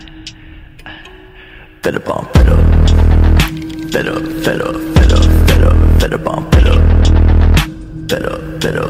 Attitude Background Music Ringtone

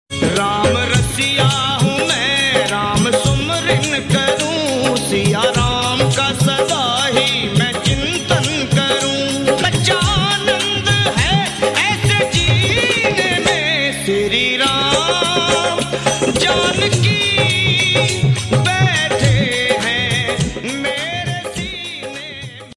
Bhajan Ringtone

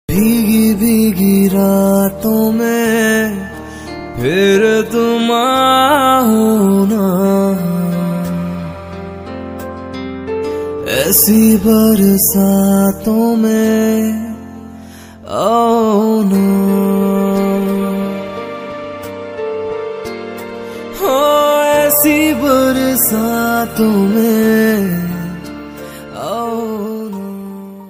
Romantic Song Ringtone

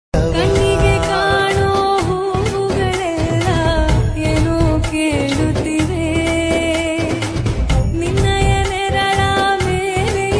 Kannada South Ringtone

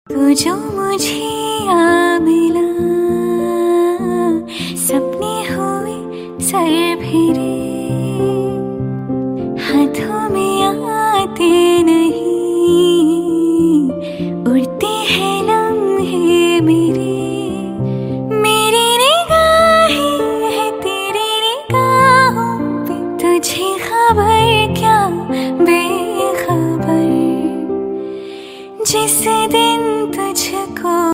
Female Ringtone

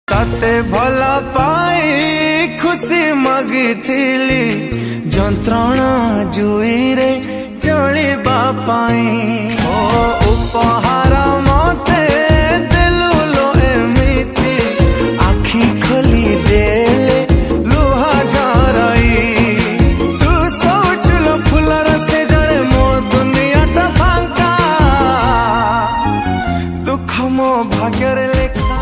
Sad Odia Song

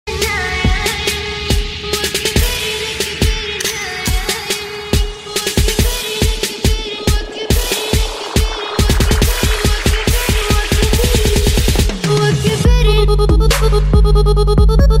Arabic Trap Remix Ringtone

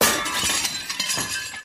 Glass Broken Funny Ringtone; ringtones

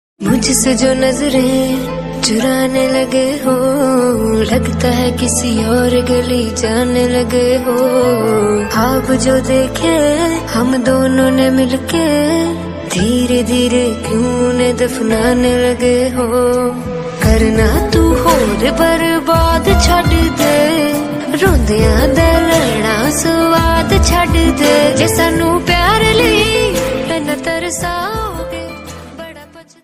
Female Ringtone

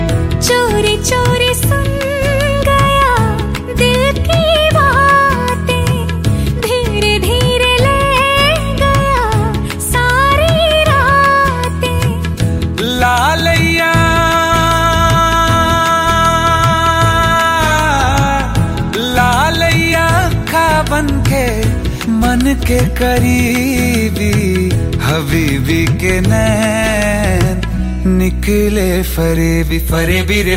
Song Ringtone

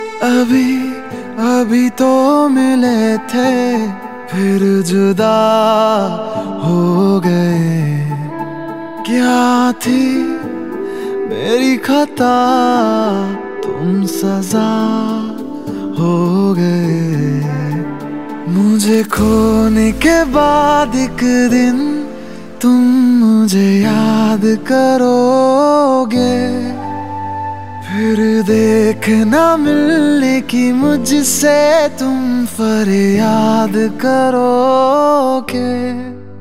New Sad Ringtone

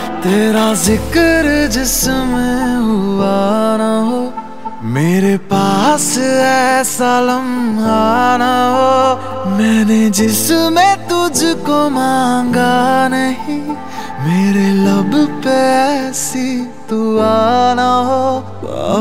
Sad Song Ringtone

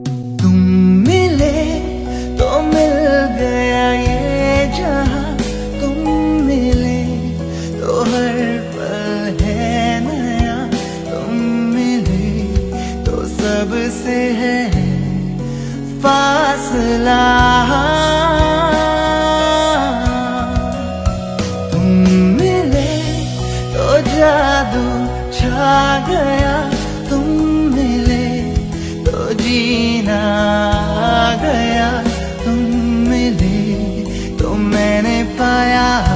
New Love Song Ringtone

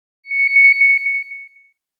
Allusive Ringtone

